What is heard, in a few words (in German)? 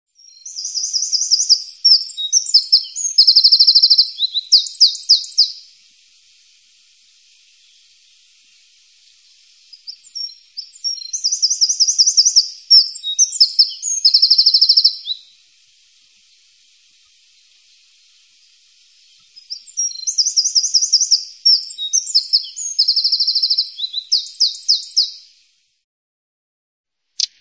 Stimme, Vogelstimmen